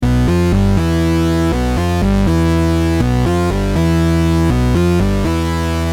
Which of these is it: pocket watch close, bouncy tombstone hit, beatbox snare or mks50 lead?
mks50 lead